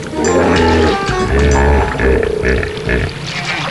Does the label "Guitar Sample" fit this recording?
No